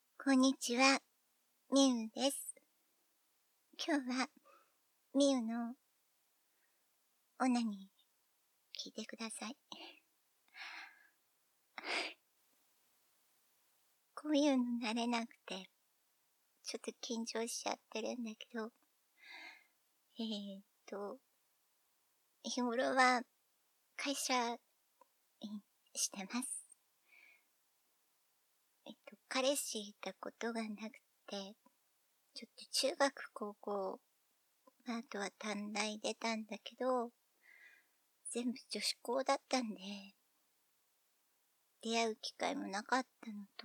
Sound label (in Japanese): ガチオナニー実演; 25歳女子高出身ガチ処女OL